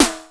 CRACKED; Dance Set 1

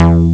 cool bass; Amiga Soundtracker Sample Packs

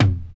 KIT, Dance Mega Drums; Best Service

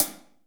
HH, DRUMKIT; Best Service; Hyper Dance

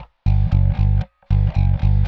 Bass, Indie Rock; Sonic Foundry (Sony Creative Software); Indie Rock Anthems; Session 06 - Haze

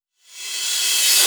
Reverse Cymbals

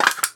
Foley Can Perc; essential drumkit

